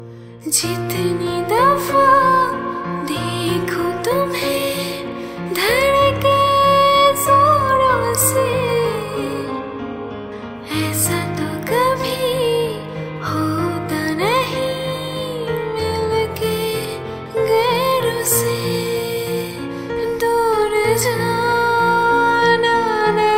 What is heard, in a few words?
Ringtone Female Version